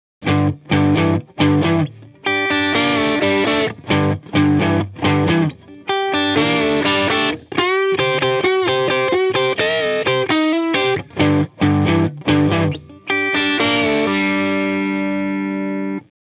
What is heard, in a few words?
Overdrive